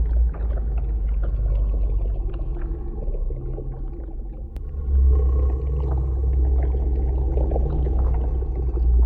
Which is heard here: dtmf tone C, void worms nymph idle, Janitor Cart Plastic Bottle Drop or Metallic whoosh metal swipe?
void worms nymph idle